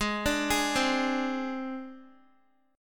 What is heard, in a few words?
Ab Major Flat 5th Ab, Guitar; arpeggiated; x,11,12,13,12,x; 4E,4A,5D,5G,5C,6F